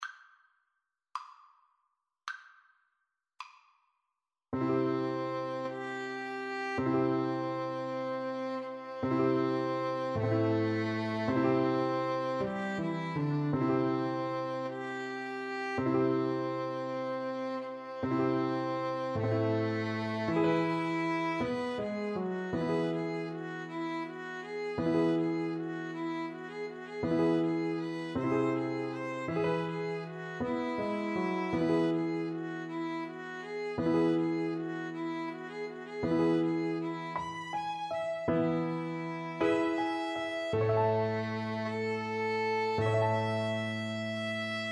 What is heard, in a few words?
Piano Trio